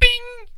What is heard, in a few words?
ping